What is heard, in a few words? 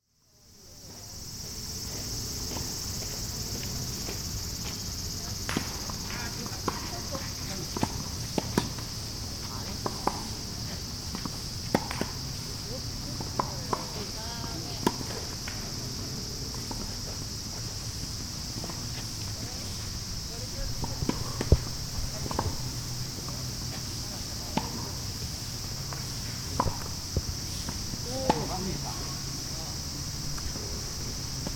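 tennis; tenisu; kikushima; Chino Cultural Complex ARCHIVES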